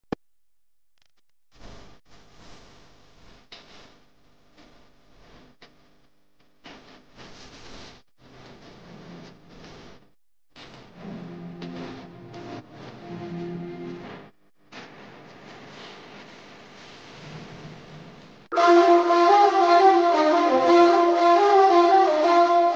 love south music instrumental ringtone romantic ringtone love instrumental